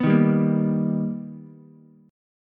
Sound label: B Augmented Major 7th B Ukulele, chord; B+M7; strummed; JGuitar